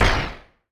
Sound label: Small Rocket